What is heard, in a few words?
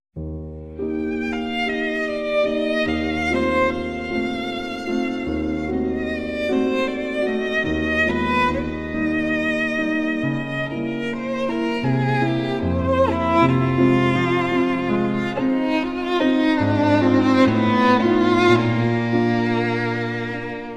Samsung S21 Melody; Tchaikovsky